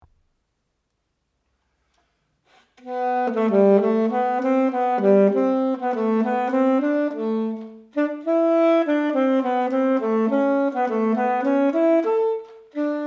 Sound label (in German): Saxophon